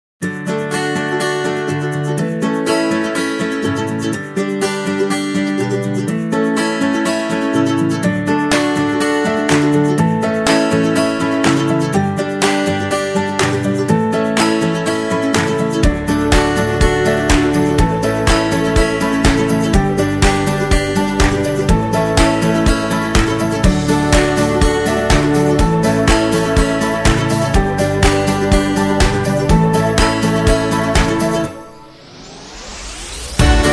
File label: Upbeat Optimistic Guitar